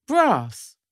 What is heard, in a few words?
brass